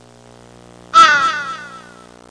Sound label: Crow echoing caw